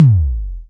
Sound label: tom, Simmons SDS8; DRUM MACHINES